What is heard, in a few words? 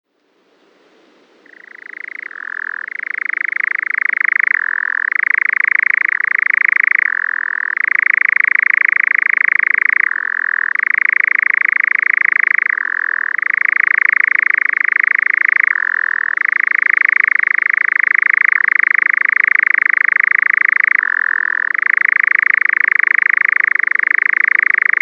Nightjar, birdsong